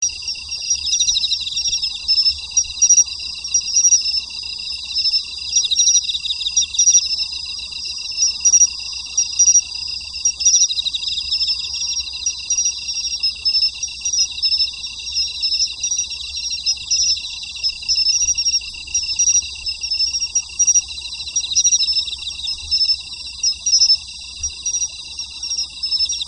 aki no kantan

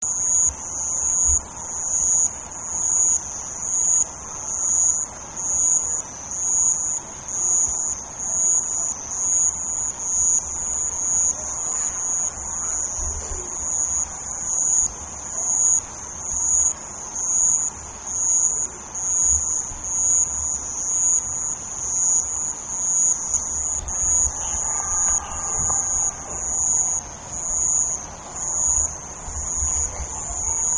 sound madarasuzu; ヤブキリ

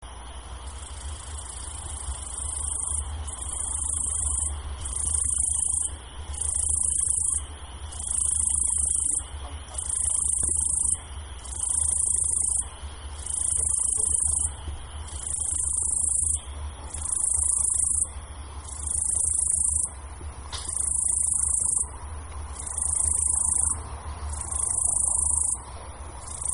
yabukiri; ヤブキリ

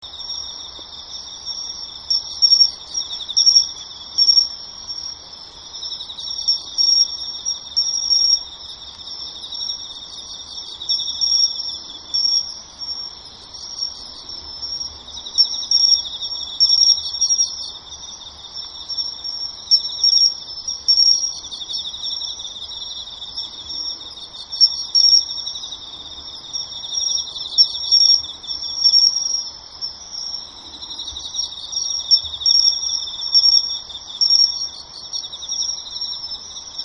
matumushi

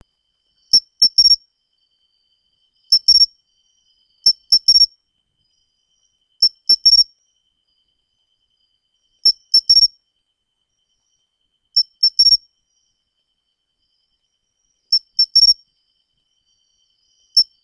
matsumushi

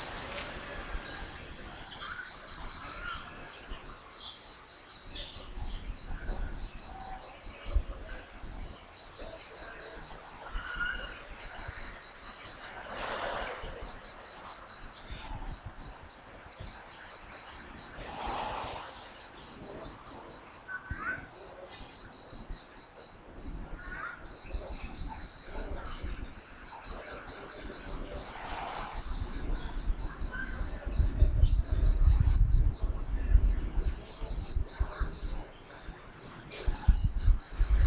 aomatumushi

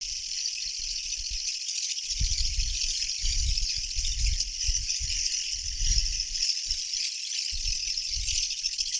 kutuwamushi

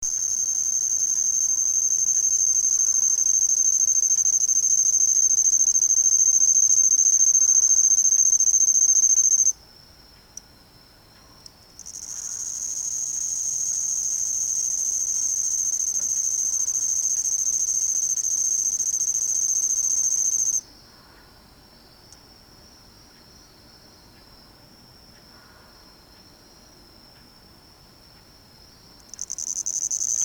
kumasuzu

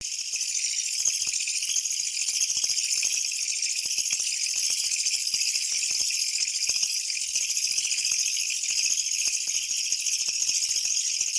kutuwamushi

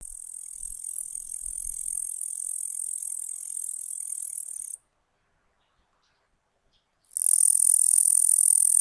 yabukiri

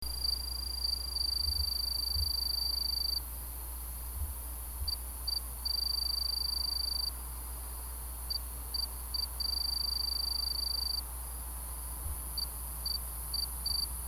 kinhibari

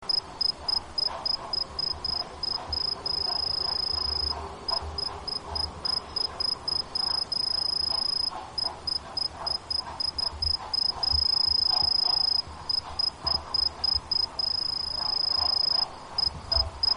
kinhibari nakigoe; ヤブキリ

